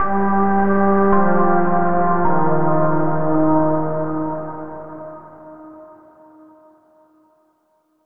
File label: Overlay Tone; Act1 Downtown